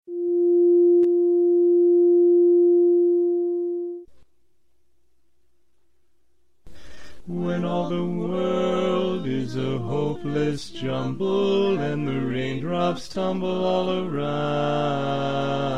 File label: Rainbow in F Bass